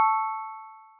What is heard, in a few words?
chime